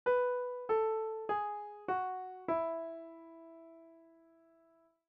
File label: descending scale